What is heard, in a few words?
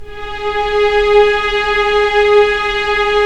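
STR SC, string section; Roland - LA Composer vol. 1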